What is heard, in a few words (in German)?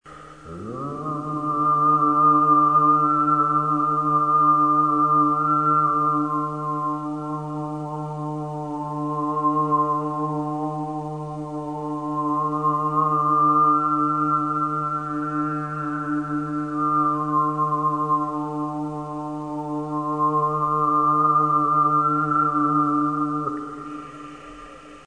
Gesang